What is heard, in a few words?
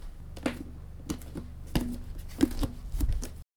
step; はだしで歩く１